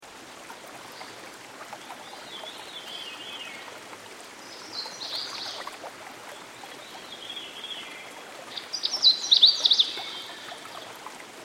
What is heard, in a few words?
Canada Warbler, Songbirds By The Stream; Dan Gibson